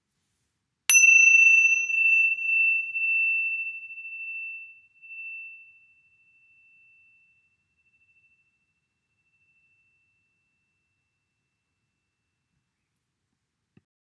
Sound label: tingsha